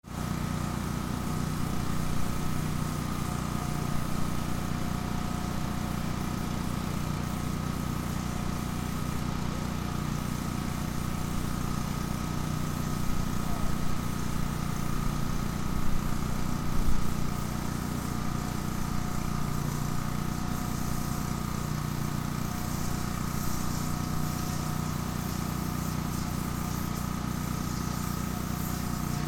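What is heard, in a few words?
decontamination far, Fukushima University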